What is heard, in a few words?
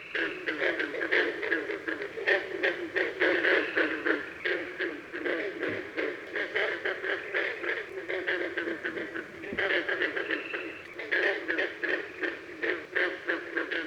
green frog, frog calls; amphibians; terrestrial